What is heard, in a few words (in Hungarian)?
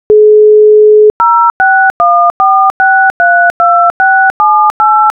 DTMF jel